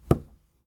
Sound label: Stick Hitting A Dreadlock Small Thud